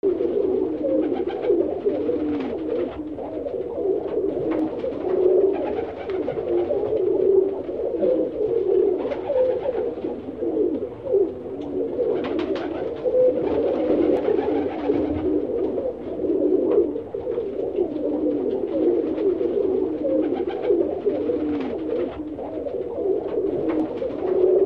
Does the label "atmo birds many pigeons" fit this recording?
Yes